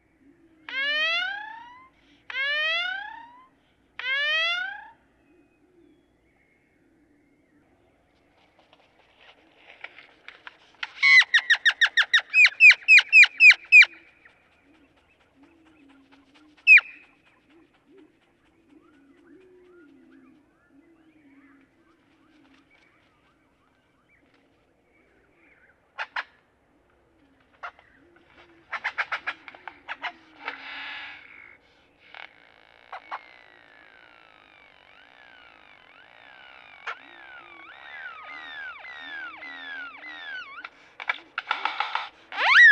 Great-tailed Grackle

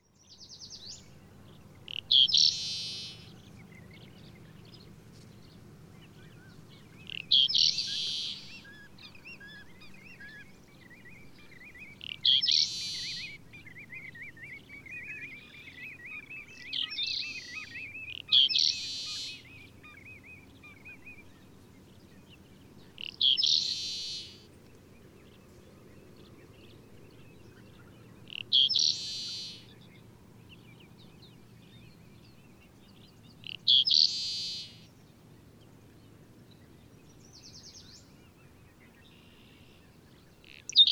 Seaside Sparrow

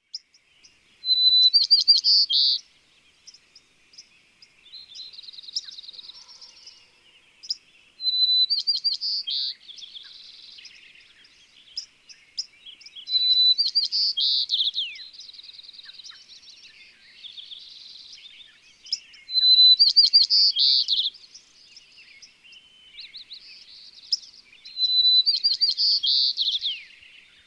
White-crowned Sparrow

